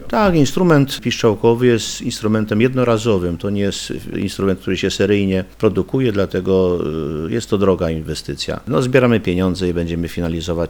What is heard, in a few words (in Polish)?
organy